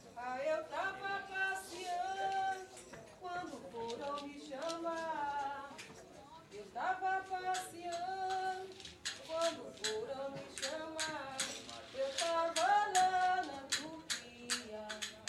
fanti baiao de princesas tava passeando; Baião de Princesas é um ritual especialíssimo e único que acontece apenas uma vez ao ano

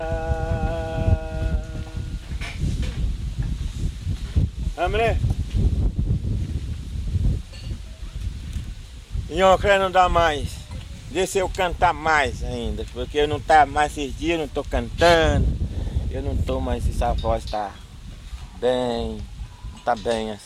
timbiras

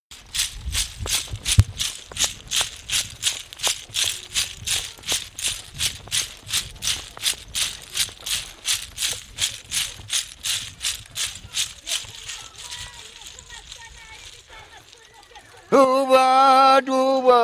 timbiras

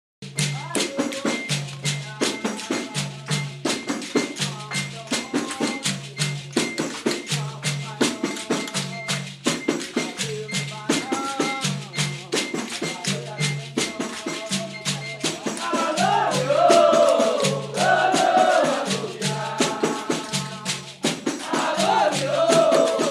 fanti baiao de princesas

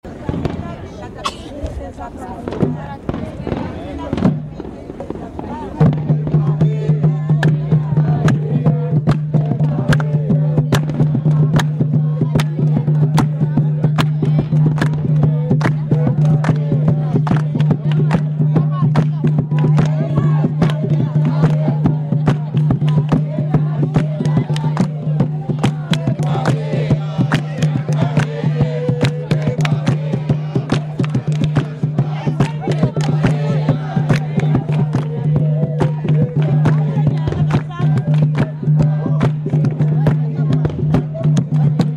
jongo de tamandare; quando eu sai la de casa

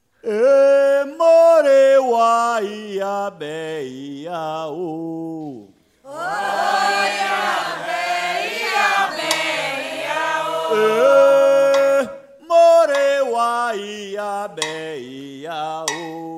toada para ewa

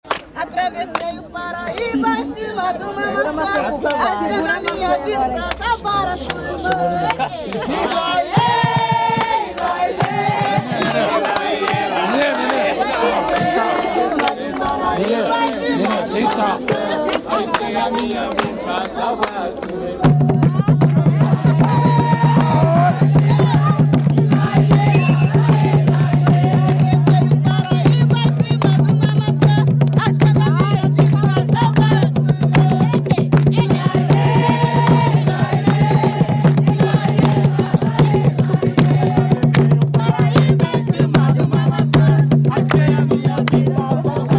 jongo de tamandare

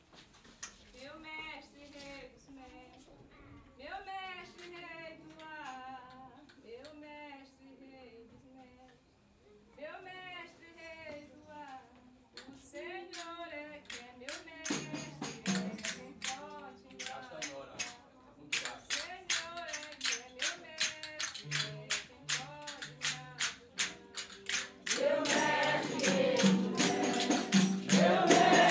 fanti baiao de princesas meu mestre; Baião de Princesas é um ritual especialíssimo e único que acontece apenas uma vez ao ano

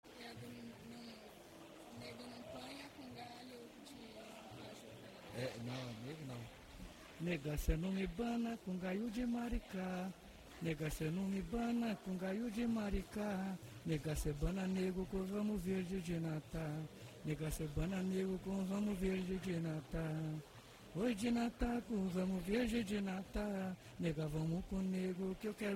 caxambu nega

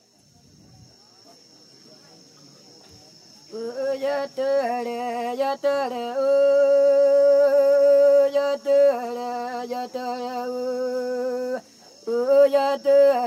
mulheres solo

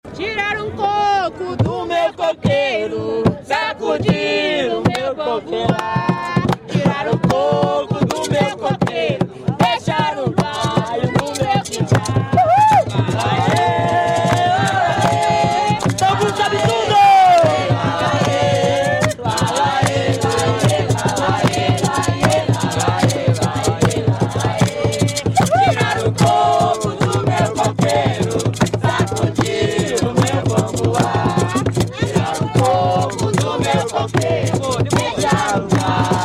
jongo de guaratingueta; tiraram coco

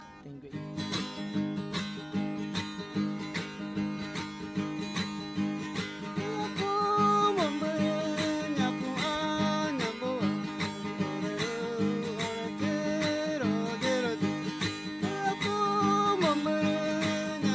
guaranis trecho aldeia silveiras

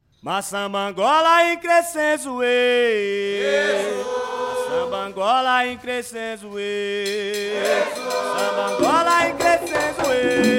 samba Angola

